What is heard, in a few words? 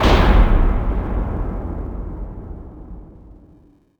land hard metal